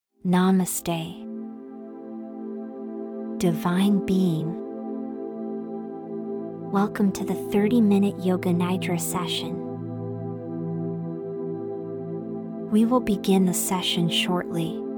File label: 888hz - Abundance Gate - Feminine